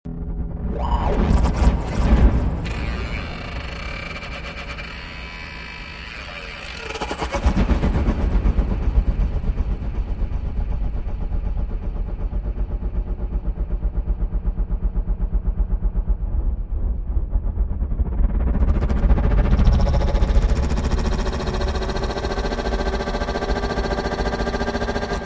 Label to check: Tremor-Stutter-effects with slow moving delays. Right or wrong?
Right